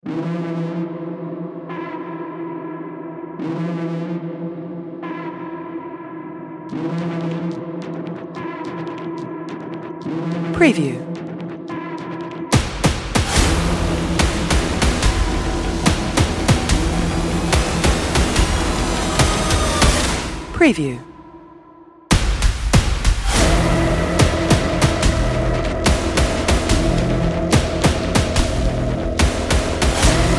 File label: Warning Signal; Epikton; hybrid